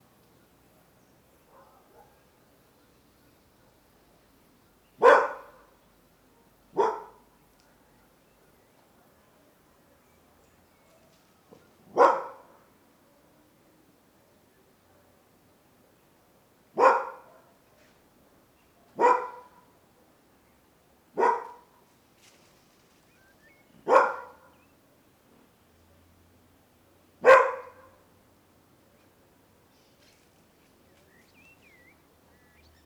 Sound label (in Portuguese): Cachorro viralata latindo; animais